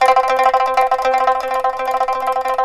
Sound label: PIPA TRL C3; INIS; Opium